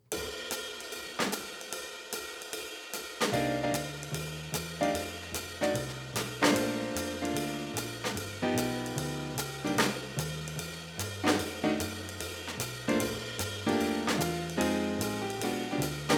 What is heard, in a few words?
backing track